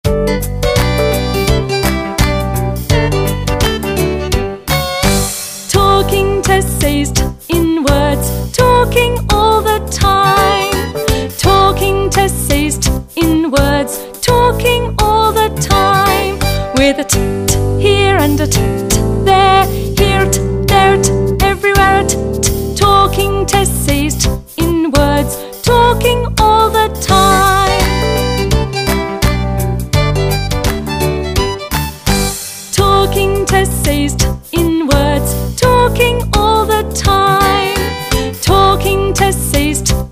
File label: Alphabet Song